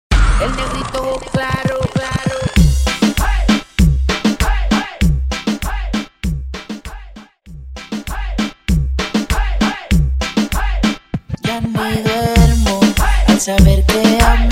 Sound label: Medley 98 bpm